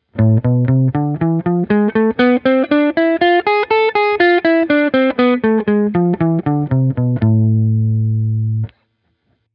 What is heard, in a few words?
blues, guitar